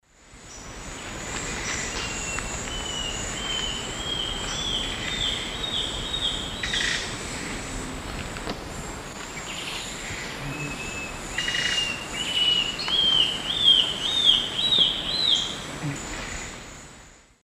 Myrmotherula menetriesii, song, S. America Eastern Lowlands